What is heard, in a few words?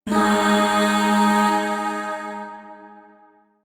Void Shawzin Chromatic Chord F; Warframe; Zariman Void Shawzin